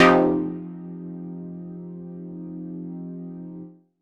stab big F, Noise; stabs

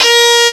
FAIRLIGHT CMI IIX, STRINGS

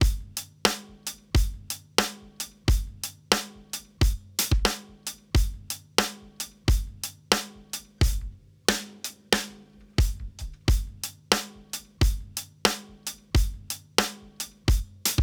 Loose Beat, drums loops; BASEMENT DRUMS VOL.1

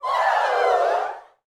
SHOUTS, Gospel

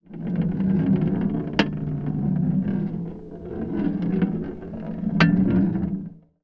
Tin Tray, Marbles, contact mic

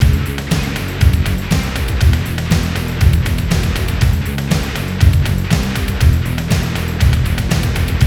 FAT ROCK; Best Service Advanced Media Trax Building Blocks; FAT ROCKA

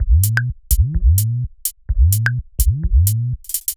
Pestule 127bpm, House Drumloops; 90 s Sample CDs; Zero-G House Fabrik Drumloops; ZG Drumloops

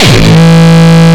Longkick, kicks

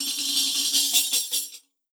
tamb, percussion; Best Service ProSamples vol.52 - World Instruments 2; MIXED PERCUSSION

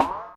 Enotype, Electric Snare, Yamaha DTXpress

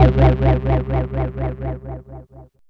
ANALOGAGOG, OBI FX; Zero-G Ambient 1

